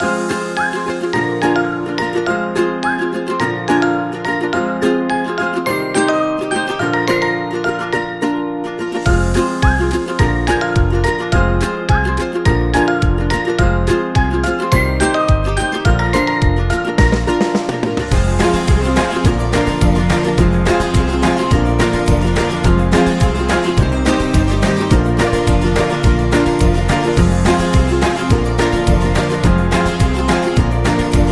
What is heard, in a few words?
Game Loop